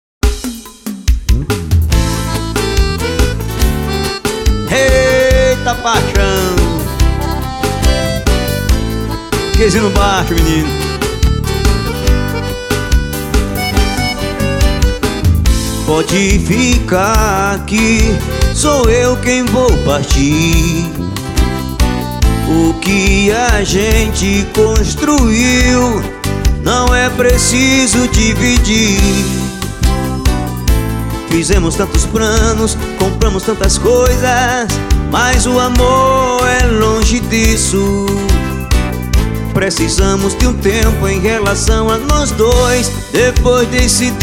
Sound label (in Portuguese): meu violao e o nosso cachorro